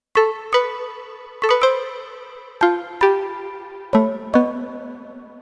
Jam Cans; Bank 2 card A

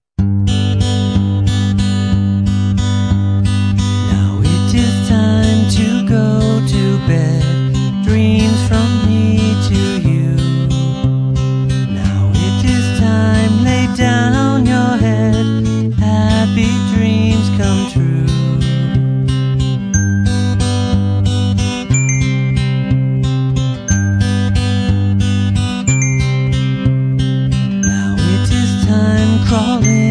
Lullaby Waltz

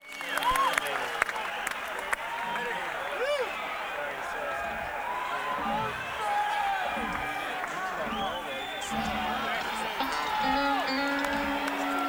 Crowd Tuning

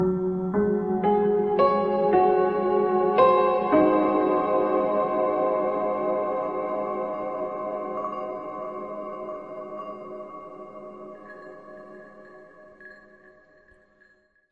bells, tibet

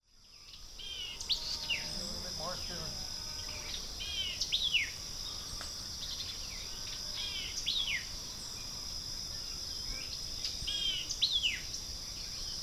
Birds

